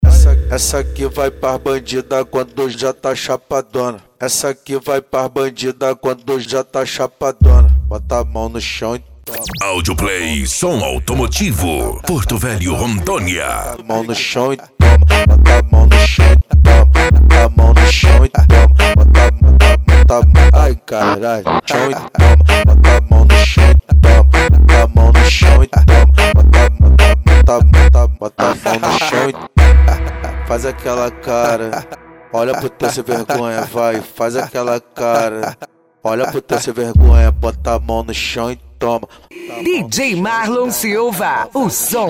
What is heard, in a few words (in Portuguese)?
esp funk mega bass